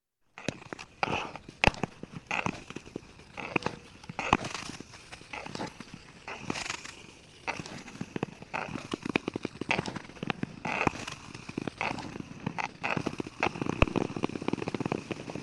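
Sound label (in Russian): коньки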